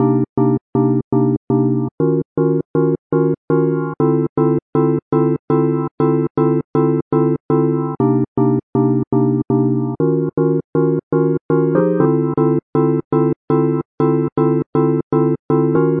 120 house organ minor chords; melodic loops; keys organ piano loops; this are my house

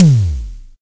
Simmons SD-5, drum machines

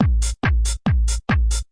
140 bpm unn tss unn tss unn tss

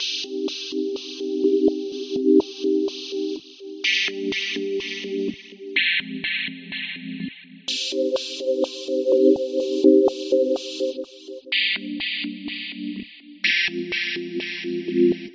sample and release phase chords, 125; SYNTH AND ELECTRONIC LOOPS

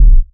KICK, Volca; STYLE KITS (Hip Hop, Etc); WallsVado Volca Sample Pack

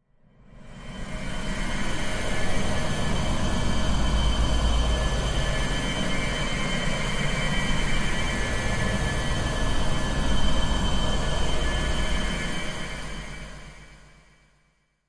dusty pad; Atmospherics

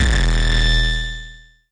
nasty stormfront; Nasty Stormfront Electro FX

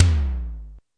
lo e tom, Toms

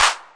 808 clap, drum machines; 808 Extended

